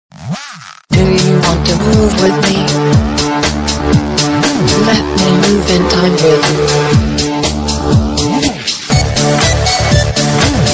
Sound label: samsung ringtone